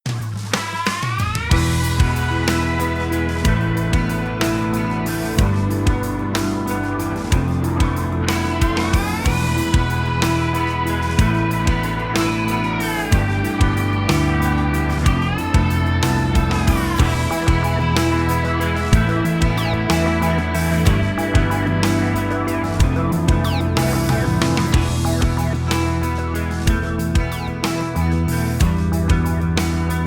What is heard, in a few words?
Instrumental; Phantoka